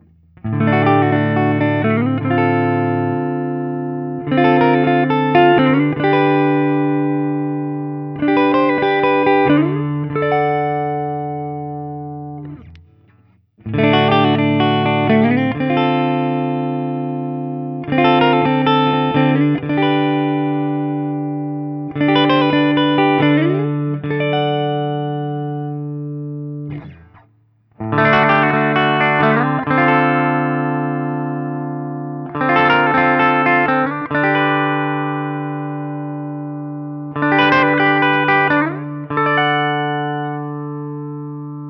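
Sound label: Guild Bluesbird Holoflake ODS100 D, guitar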